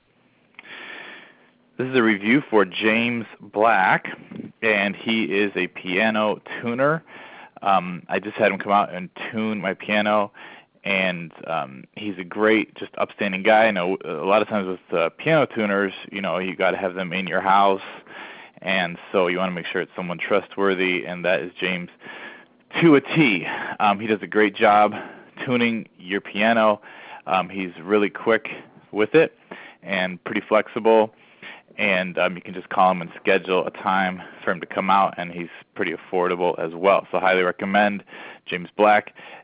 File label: Black Piano tuner